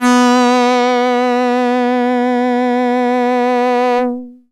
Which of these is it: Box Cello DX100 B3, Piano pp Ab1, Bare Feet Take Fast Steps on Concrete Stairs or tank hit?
Box Cello DX100 B3